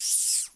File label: rat